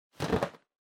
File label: Balloon Noise